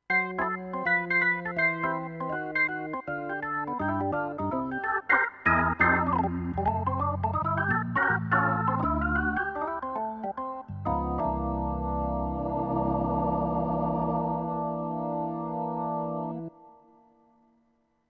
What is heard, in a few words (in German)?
Tyros 5 Styles Klassik Bars